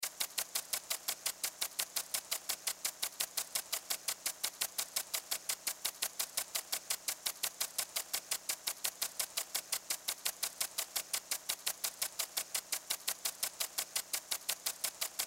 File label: Sprinkler Steady, Water Sprinkler Steady Constant Sputter Loop Household Lawn; Yard Tool; Yard Tools; Blastwave